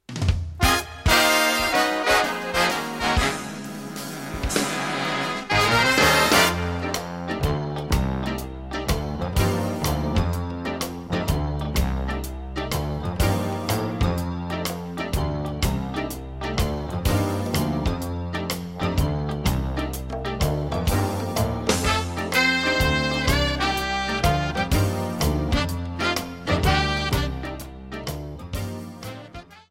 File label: Kicks and Sticks